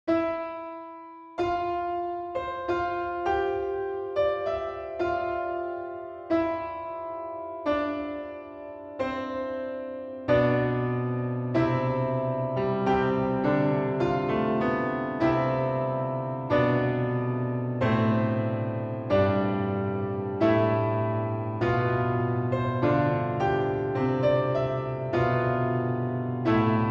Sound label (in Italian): canone sulle campane; Canone sulle campane (2021